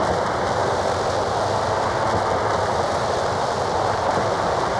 Cracklature, sparse soundscape

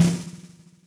Electric Snare

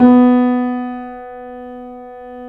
C7 F11, The Ultimate Piano Collection; East West

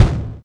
Deviate Kick; Sonic Foundry (Sony Creative Software); Drums & Drones; Construction Kits

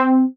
SYN, Synths; M8; Electronisounds

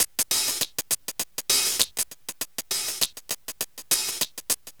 Smooth, R&B Drums

